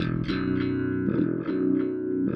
Delay, Bass; Effected; Bass X

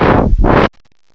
SCRATCH; M8; CMI Factory

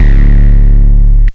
Grunge Bass; AMG - Prototype Drum & Bass

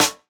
Snare, JUNGLE BREAKS

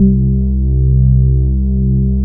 Korg POLY800 C1; Back In Time Records; Korg Universe VOL-1

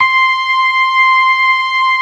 ORGAN, LOWER MANUAL

